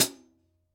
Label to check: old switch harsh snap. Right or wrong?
Wrong